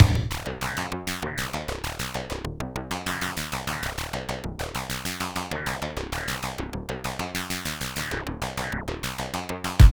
LOOP, Tekno Industrial; East West

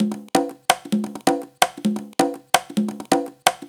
Live Percussion, 130 BPM; Sonic Foundry (Sony Creative Software); Underground UK House & Electro

